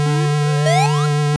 Crash Warning; Ace of Aces; Master System

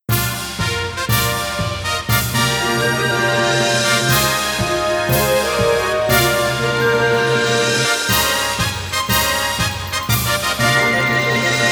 War Victory Jingle; Genso Suikoden V Original Soundtrack

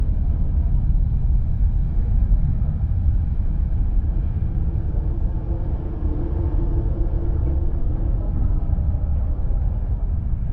Ambient Prison; silent hill homecoming; ps3

